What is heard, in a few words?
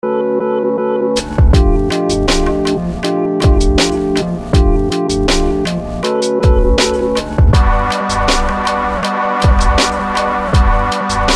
motion scapes